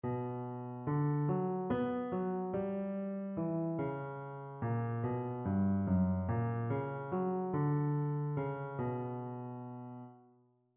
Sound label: melodic error detection